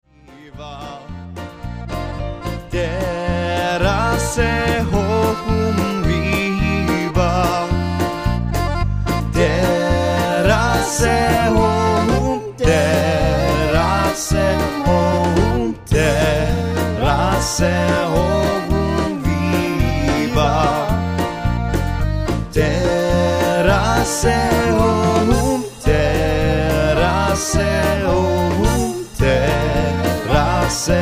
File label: Polka